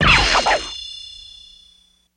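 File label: Laser Blade Ricochet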